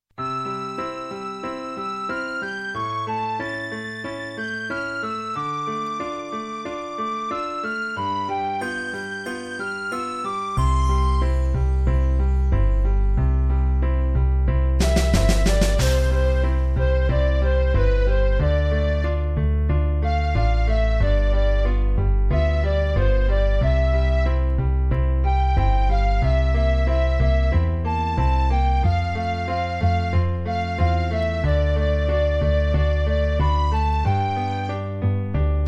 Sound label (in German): zirkuslieder playback